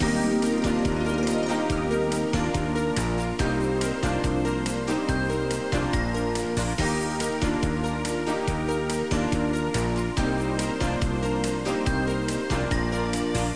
trilha; GEOGRAFIA

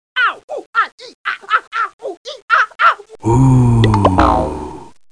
Urso; GEOGRAFIA

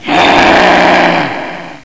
AHH LOBO; 7invasores

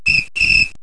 APITO

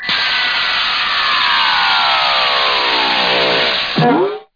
disco pousa; 7invasores

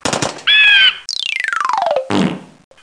Ave; GEOGRAFIA

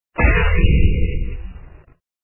raio; anacroz

